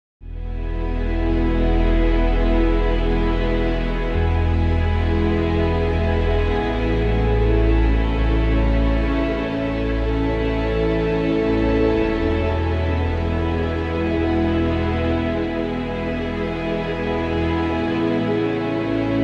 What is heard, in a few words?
meditation